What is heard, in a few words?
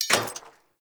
Burst Gun Mech; Warframe; Archwing